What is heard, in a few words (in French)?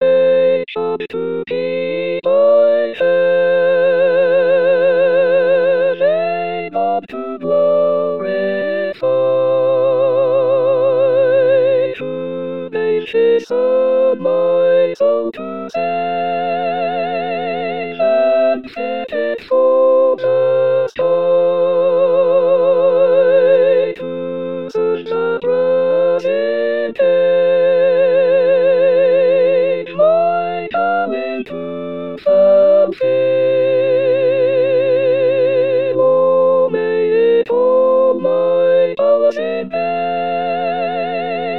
Hymne original lent